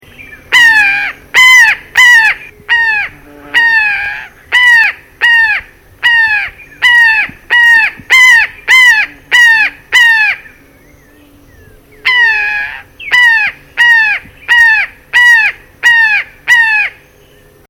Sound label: brown jay calls